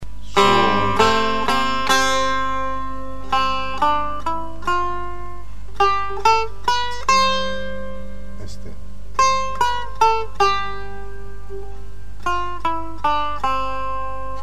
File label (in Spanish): Baiate Tork escala Do, Musica persa